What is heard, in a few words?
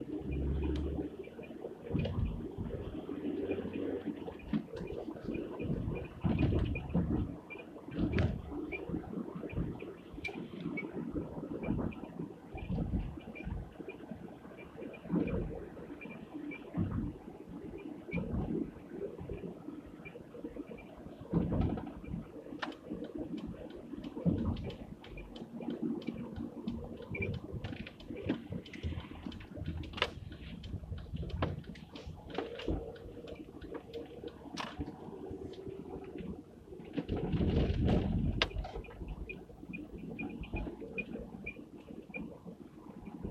annoying sound; volvo s80